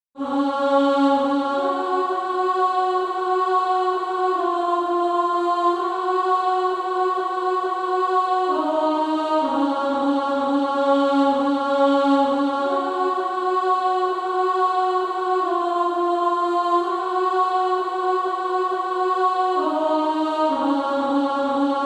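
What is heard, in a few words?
Female Choir, alto 2; On Christ the Solid Rock I Stand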